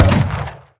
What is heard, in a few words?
towers cannoneers basic Attack